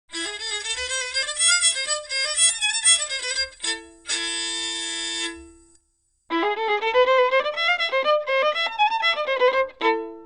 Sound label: violin with and without body